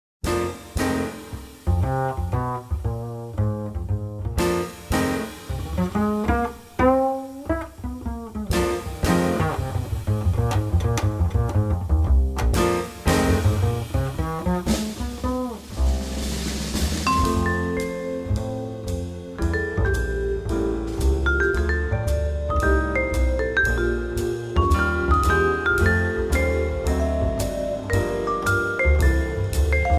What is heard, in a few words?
Quartet, Groove